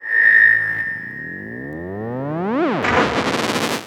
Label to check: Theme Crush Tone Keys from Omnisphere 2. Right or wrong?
Wrong